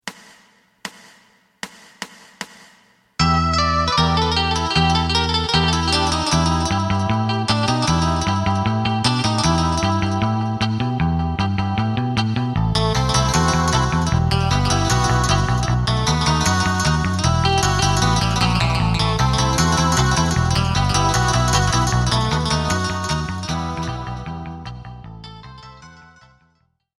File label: TWO GUITARS drum; エレキバンド用カラオケ